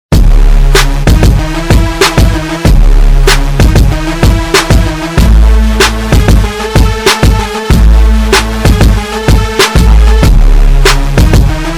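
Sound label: Test dlya sabvufera, Bass, subwoofer test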